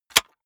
cassette case close hard

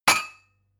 glass shelve

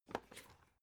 alu case small handle

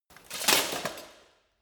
light bodydrop

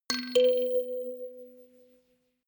notification kalimba rattle octave up

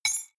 key ring small drop stone

